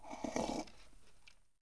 piti; Mafie 1

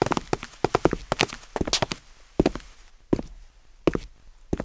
skupinka; Mafie 1

